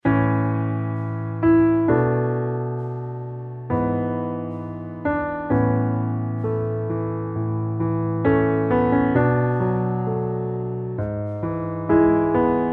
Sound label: Underscore PIANO; Worship Flow